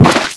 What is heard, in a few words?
Turtle Bounce; Finding Nemo; XBOX